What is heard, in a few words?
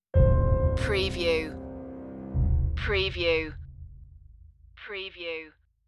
vacuum stinger